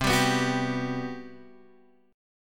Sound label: G Major 7th, C; strummed; JGuitar